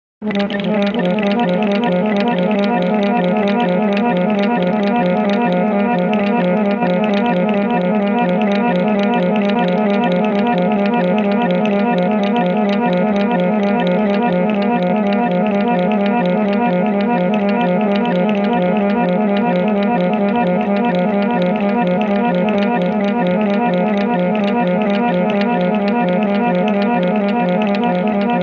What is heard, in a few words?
Untitled Organ